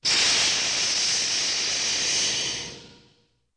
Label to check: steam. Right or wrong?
Right